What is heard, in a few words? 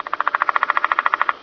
bugs, jungle